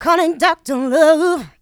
Female Vocals; Houseworx

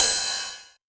909 Ride; Novation Drumstation

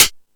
High Hat, Drum Machines

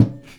SURDO, Percussion Slam; InVision Interactive

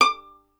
STR VLN, Solo Instruments; Miroslav Vitous

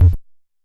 Kick Hi, Stylophone Beatbox

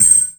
SOUND EFFECT, Metal; AKAI S6000 CD-ROM - Volume 1

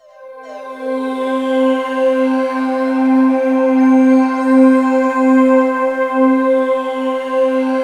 STARF C4, PAD; Best Service Dream Experience; S#PAD

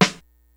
GREASY KIT, DRUMS; Kid Nepro; Elite

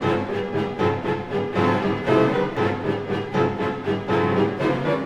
Orchestral Loops

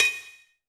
Roland TD-20 Percussion

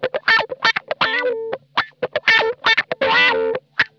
COARSE WAH, Funk Guitar; Zero G - Funk Guitar

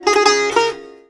SITAR GRV, World Colours; Best Service

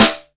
SN OPEN JAZ

